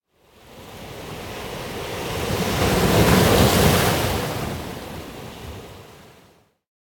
Ocean Surf On Rocks - Hard And Slow Waves On A Rocky Coast; Hollywood Edge; sound designer's toolkit v2